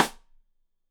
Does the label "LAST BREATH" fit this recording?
No